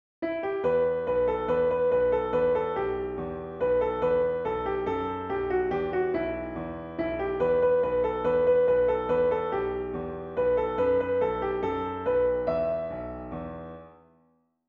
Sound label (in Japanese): ピアノ